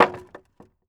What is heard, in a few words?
IMPACT Wood Plank On Wood Pile Short mono; Infernal Radiation